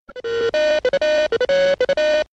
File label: Phone; Austin Powers